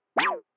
Synth Whip Sound

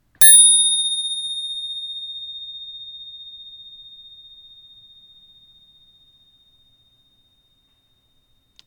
Bike Bell

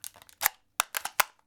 Gbc Reload

